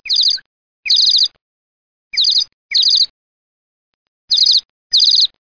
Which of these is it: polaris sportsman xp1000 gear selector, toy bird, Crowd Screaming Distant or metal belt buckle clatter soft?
toy bird